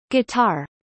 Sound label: guitar